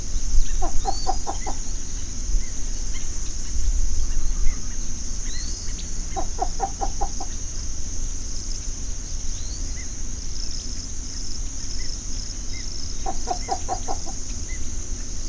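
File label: Least bittern coo; National Marsh Bird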